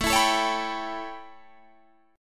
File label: A 9th A, Guitar Open D